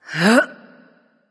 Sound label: grunt jump; smite; neith